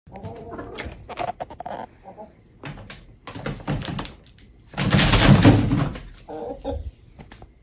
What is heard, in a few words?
cat and chicken fighting; audio meme